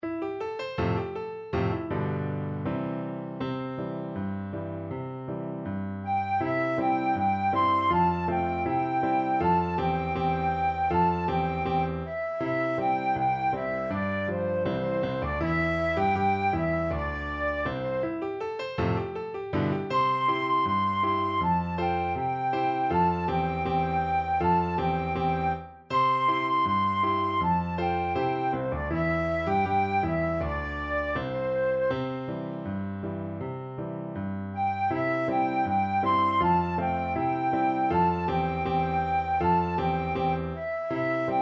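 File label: bound for south australia flute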